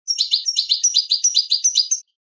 padreos corridos; campero

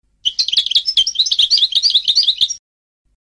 adorno de tabli; campero

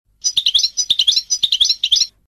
floreo titibali titibali; campero

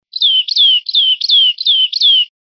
golpes corridos de yunque; verderon

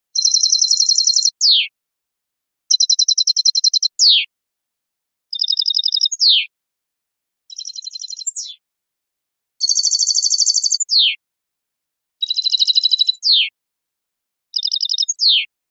terminacion tititichio

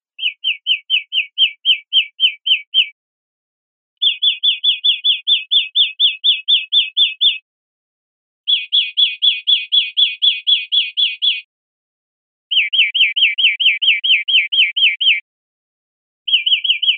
golpes corridos biobio, verderon

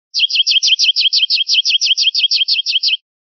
rep chachases, pardillo

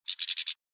total pedorreta, campero

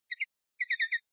toques de tateo; linked files test pardillo; adornos

